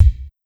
old school phat bass drum c sharp key; kick

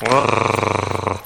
whimpering

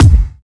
raw kick drum one shot g sharp key

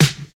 2000s Hip-Hop Snare Drum D Key, steel snare drum; snare drum samples

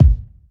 rich low end bass drum single shot g sharp key

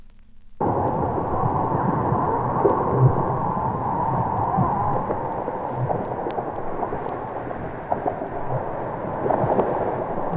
tropical atmosphere at night; sample vinyl horror

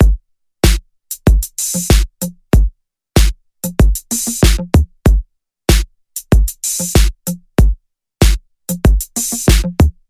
95 bpm drum loop sample G sharp key

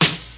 noisy acoustic snare sample D# key

